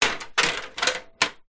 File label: industrial step ladder metal rattle movement